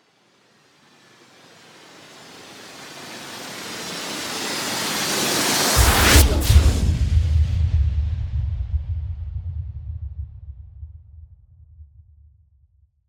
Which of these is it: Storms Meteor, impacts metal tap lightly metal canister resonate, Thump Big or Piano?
Storms Meteor